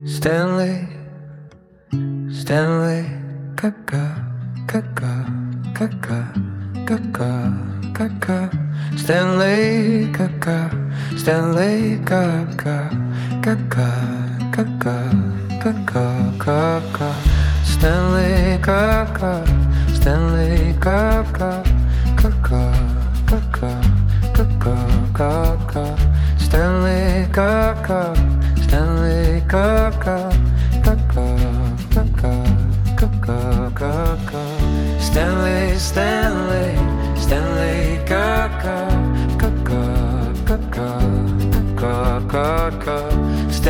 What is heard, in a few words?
acoustic